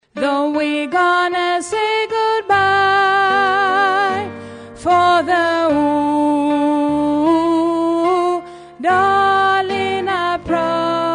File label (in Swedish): bas